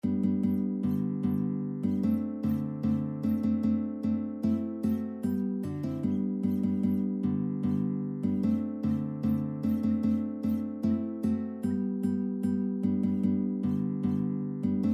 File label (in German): Sechser Polka 3 Gitarren leicht